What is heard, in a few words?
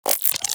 Shotgun Mech; Weapons; Warframe; Tenno; Revenant Shotgun